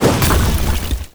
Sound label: Magma Hook attack; IgnisVolcano